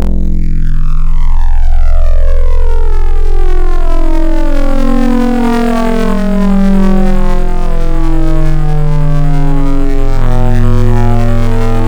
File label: Hyper, Wavetables